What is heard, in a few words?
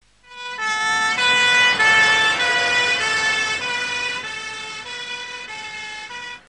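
ambulance europe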